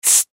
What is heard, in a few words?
Sci Fi Air Expel Hose Pneumatic Burst; Spacecraft; Blastwave